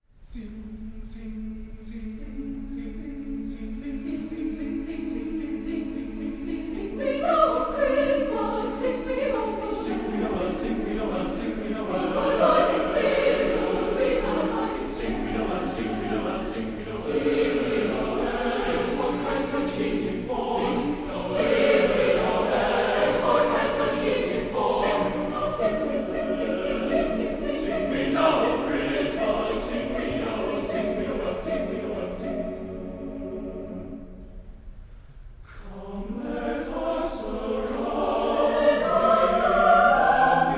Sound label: SATB arrang; Колядки; Sing we now of C-mas